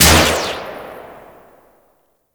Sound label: Mixdown Shot; Ember Prime Boss; Warframes